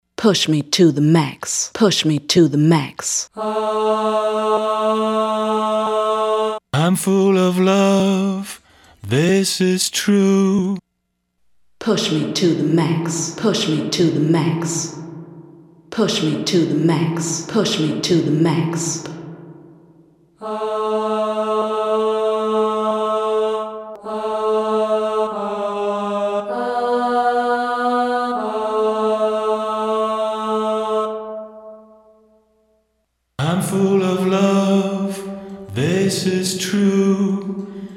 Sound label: Quiet Tunnel, Roland SRV-3030; Preset